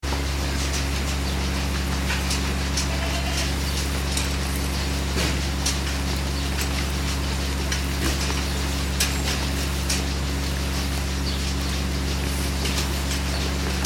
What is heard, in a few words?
ambience farm goats stall feeding milking equipment; Farm Ambience - Goats Stall Feeding Inside Large Barn With Milking Equipment Running In Ba; Backgrounds; Farms; McKinney Sound